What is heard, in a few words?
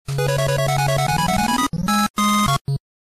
Game Start